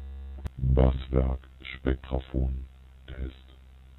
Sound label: spectraphon vocal spectr; Make Noise